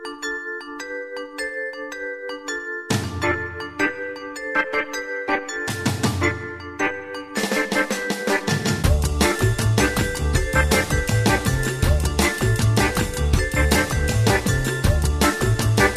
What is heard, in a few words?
Swinging Sixties